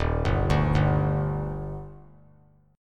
Eb Major 7th; EbM7; arpeggiated; JGuitar

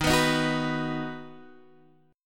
Eb Minor Sharp 5th Guitar Half Step Down

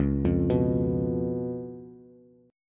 Db 7th Db down Bass; Db7 arpeggiated; JGuitar

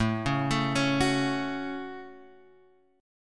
A 6th, Guitar, Open G; 7,6,7,6,7,x

